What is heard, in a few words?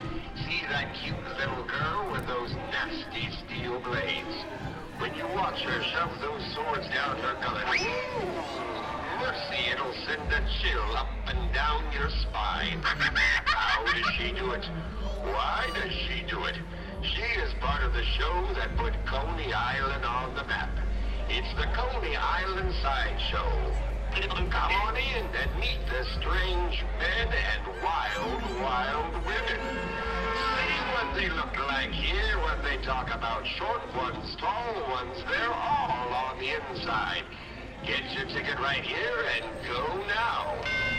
sword eater coney island